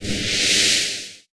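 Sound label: Se Battle Breath shell; New The Denpa Men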